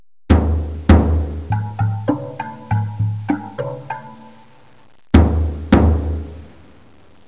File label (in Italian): musica newage